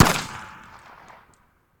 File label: Bullet Impact; Sound Ideas; Guns